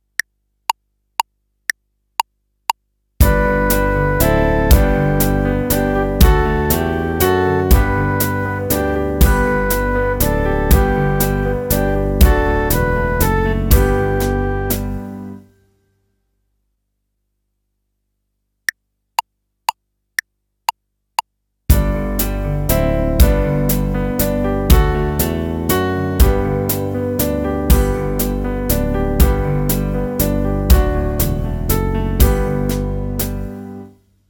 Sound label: Waltz, fife